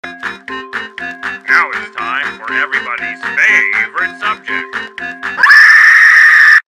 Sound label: ahhhhhhhhhhhhhhhhhhhhhhh